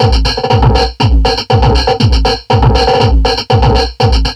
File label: Drum Loops Crazy Processed